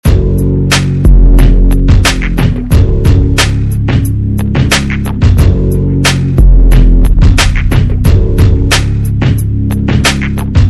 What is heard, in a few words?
Smoothsby 90bpm